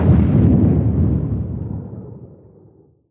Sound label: inc grenade detonate distant; weapons; Counter Strike Model Pack; CS2